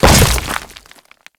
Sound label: Grineer Land Dirt, Footsteps; Enemies; Warframe